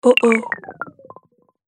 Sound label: Low battery, notifications; Orange Rise 54